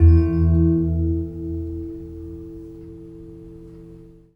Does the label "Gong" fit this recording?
Yes